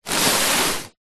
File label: plastic bag bin liner full of soft clothes or pillows slide ground; zapsplat; foley